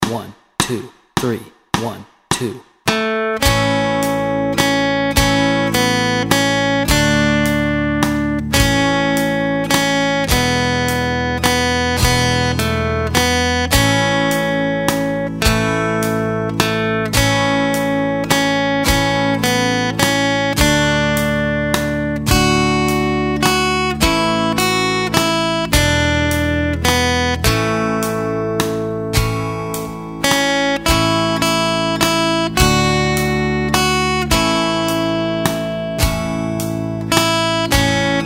For Hes A Jolly Good Fellow Melody and Chords Track, guitar